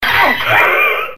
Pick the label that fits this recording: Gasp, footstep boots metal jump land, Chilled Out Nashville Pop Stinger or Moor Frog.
Gasp